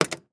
Vending Machine; HauntedBloodlines; SFX Producer

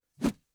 Dowel Swishes; HauntedBloodlines; SFX Producer

